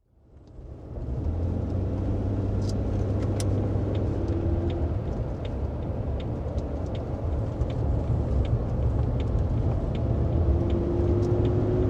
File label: Jaguar Sovereign, interior, approach motorway, constant run on motorway, leave motorway; 36 litre, 1986/7 model; Car; Car Sound Effects; BBC